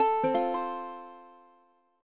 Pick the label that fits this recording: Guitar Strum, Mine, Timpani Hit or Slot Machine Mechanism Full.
Guitar Strum